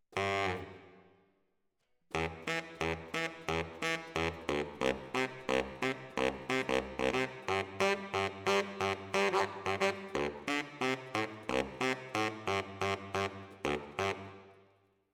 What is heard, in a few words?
ORTF SCHOEPS, piano